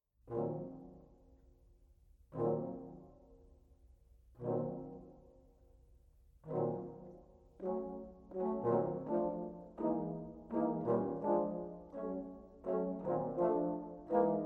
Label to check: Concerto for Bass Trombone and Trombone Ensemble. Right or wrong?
Right